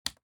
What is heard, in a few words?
key